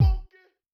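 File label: bass drum funky, Kick, Yamaha DTXpress-II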